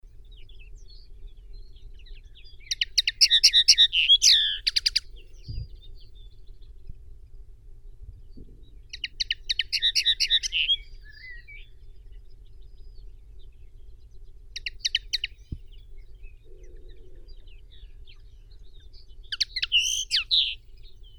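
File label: linnet, Carduelis cannabina; konoplyanka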